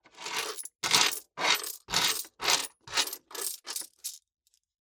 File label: Coins Scrape Off Wood